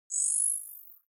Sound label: ui classic zoom out light